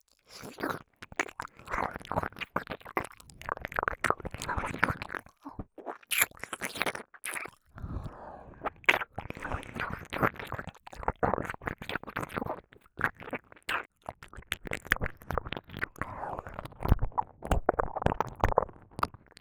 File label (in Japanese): 耳舐め音あつめ（左耳のみ）; 耳舐めのみトラック